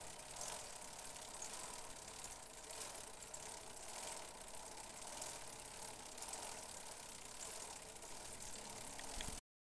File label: Bicycle Spokes